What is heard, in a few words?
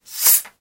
Opening A Fizzy Bottle